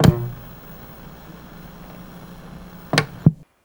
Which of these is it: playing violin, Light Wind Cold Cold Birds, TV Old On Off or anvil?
TV Old On Off